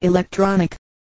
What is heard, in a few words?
electronic